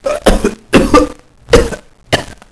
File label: cough; zombie plague